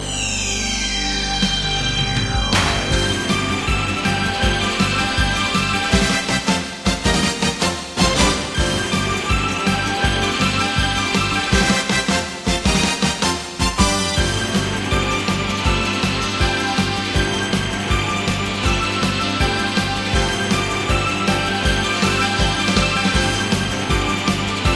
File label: Gorgeous Meditation